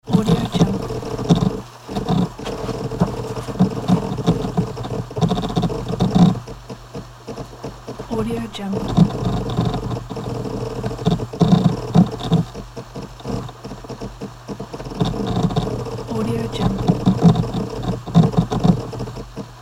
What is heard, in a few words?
hard drive working; tonwagensfxlab